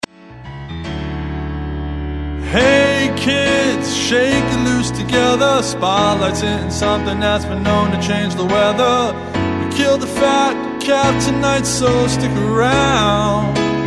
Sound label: vocal